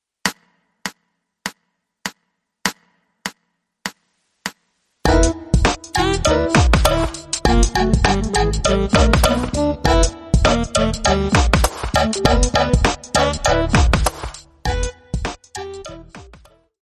2 handed Medium

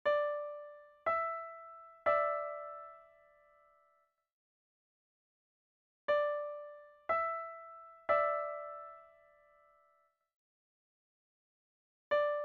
treble d e

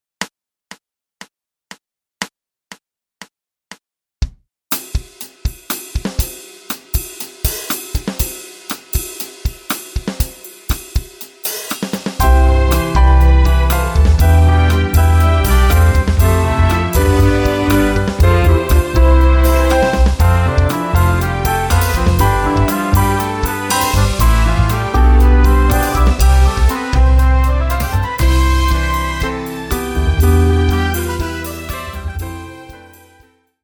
tempo120 with piano; Joy to the world; My First Rhythm Cup Explorations

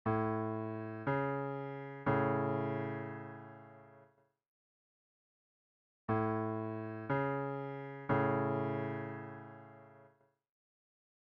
bass a c

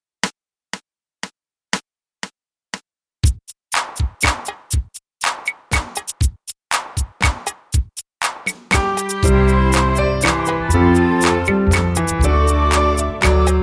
We wish you a merry christmas tempo120 with piano; My First Rhythm Cup Explorations

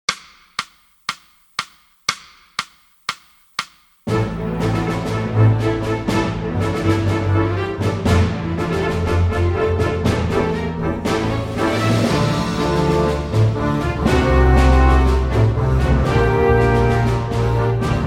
Dramatic Fast

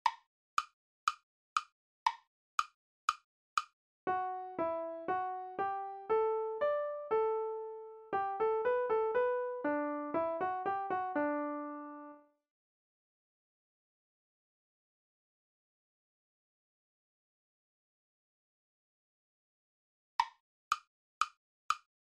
melodic

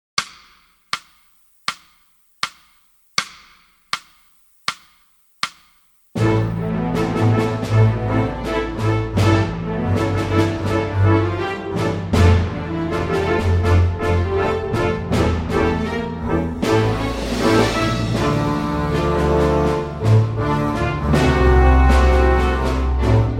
Dramatic Slow

